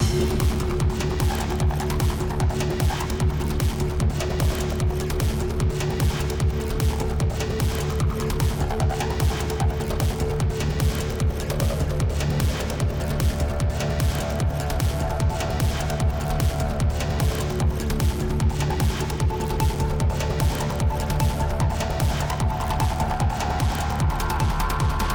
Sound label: Kingdom Chase 8CH 150BPM